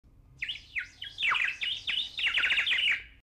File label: loop